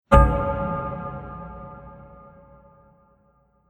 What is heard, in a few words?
Treated Metal Hit; Metal Sound Effects; Impact